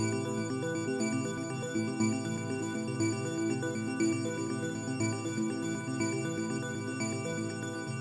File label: Bell Sequence